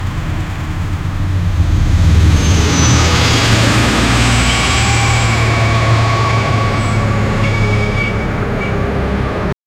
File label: heavy semi trucks passing